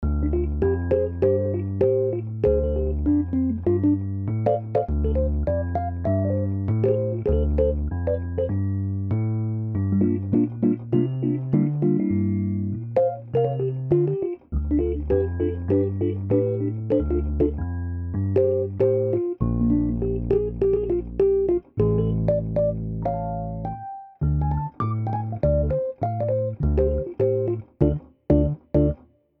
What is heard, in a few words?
Pianet T Jazzy; hohner; pianoteq8